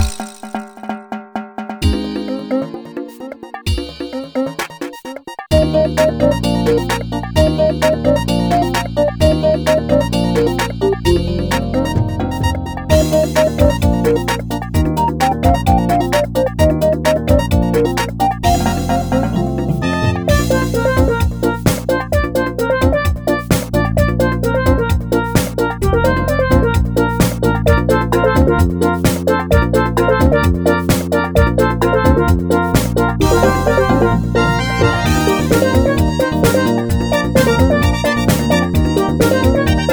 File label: Jungle Drum